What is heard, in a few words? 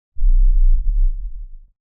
Sideways Town Giant Floor Panel Arrive Rotate Lock LFE; Boomtown; Titanfall 2